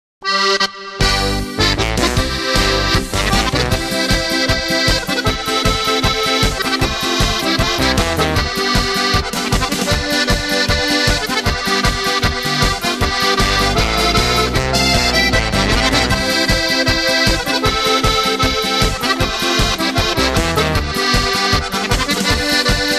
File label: polka